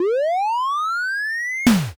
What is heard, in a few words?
defib